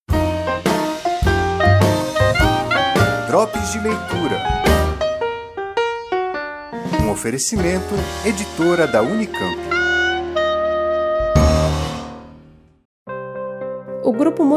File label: drops eletroacustica